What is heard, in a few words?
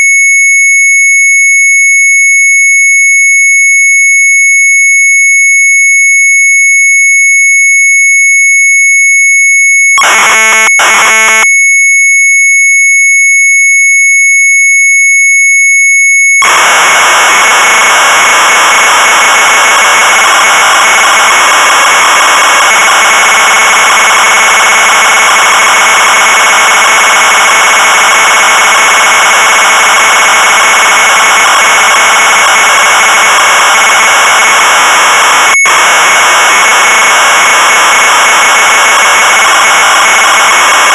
MZ800 Tape, sharp; VARITEST 2-102; retromelsarchive